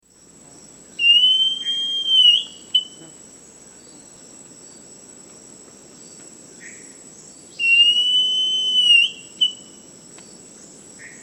Thrushlike Mourner, S. America, Natures Songs

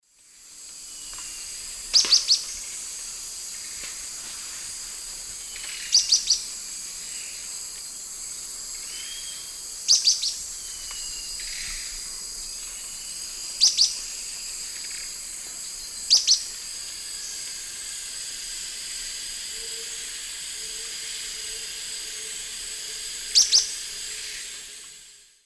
Hylophylax naevius, terra firme, call; S. America; Eastern Lowlands

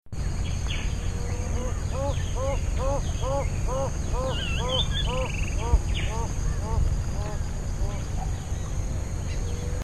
Rufescent Tiger-Heron, S. America

